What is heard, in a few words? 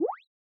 click